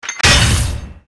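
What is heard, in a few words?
dead gate death; monster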